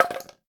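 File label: Log Stone Hit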